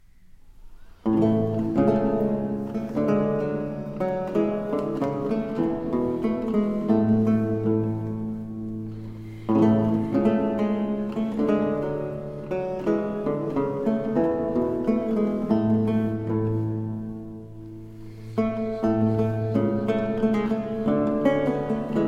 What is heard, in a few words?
Chaconne in C major